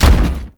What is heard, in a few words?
Step; Warframe; Corpus; Jackal Redux; Steps